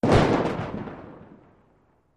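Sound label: 2 Oz Black Powder Bomb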